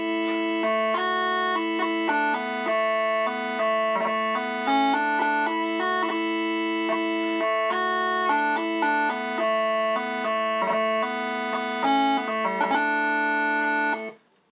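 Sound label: It Came Upon a Midnight Clear, bagpipe tunes; the holidays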